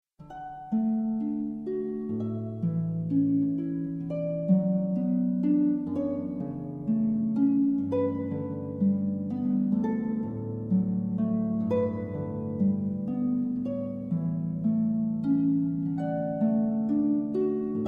Canone arpa; Pachelbel; matrimoni

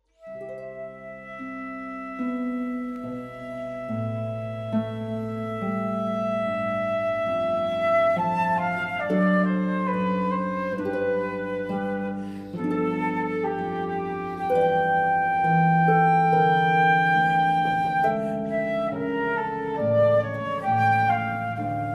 arpa flauto; JS Bach; Aria sulla IV corda; matrimoni

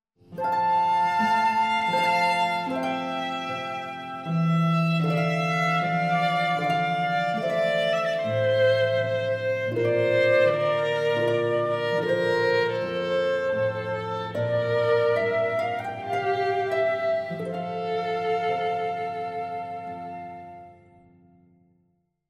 arpa flauto violino; H Purcell Ground in F

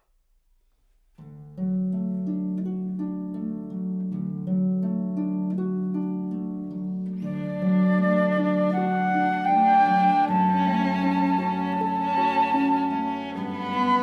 arpa flauto violino; matrimoni

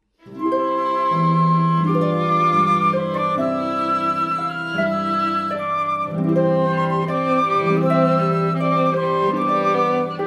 Marcia nuziale; Clarke

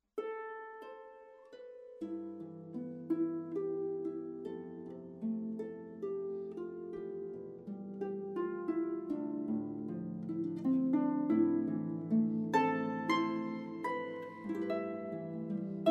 Tema d amore, arpa; matrimoni

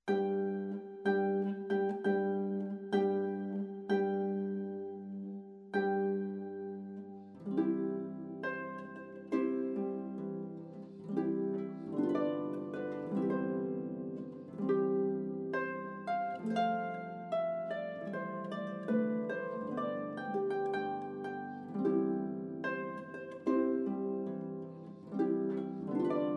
arpa; Marcia nuziale; Lohengrin; Wagner

